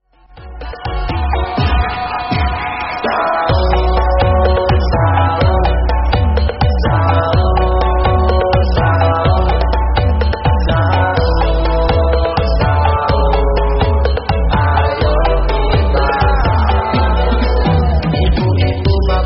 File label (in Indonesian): alarm bangunin sahur Remix; TikTok; DJ Remix TikTok; ringtone